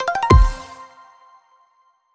small win; netent

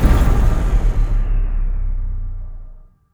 near Win End; netent

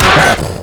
Grunt Hit Light; netent

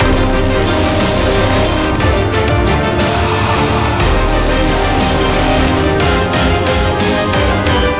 big win loop; netent

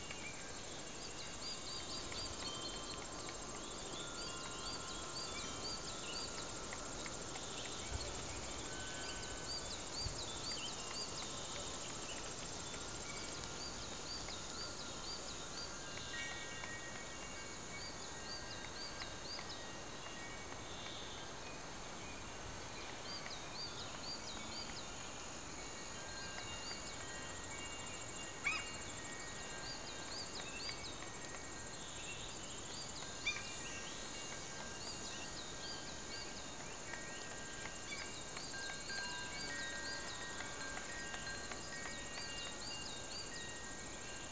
ambience General; netent

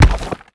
symbol Landed

